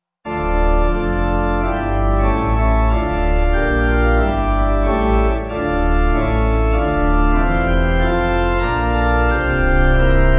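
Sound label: pipe organ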